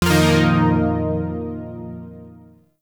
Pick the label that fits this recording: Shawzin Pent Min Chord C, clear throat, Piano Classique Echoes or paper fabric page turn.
Shawzin Pent Min Chord C